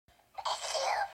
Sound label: Duck Sneeze